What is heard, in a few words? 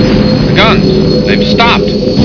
guns